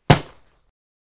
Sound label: Football Catches